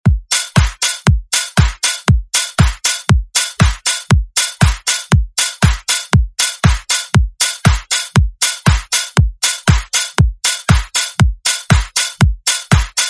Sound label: 80s Dance